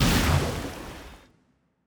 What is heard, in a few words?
Attack; Azeria